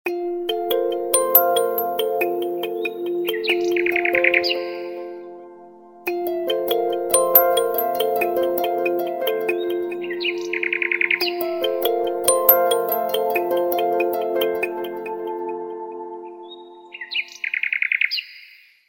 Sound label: Crystal, RingTone; BQ 5731L-Magic-S; BQ Russia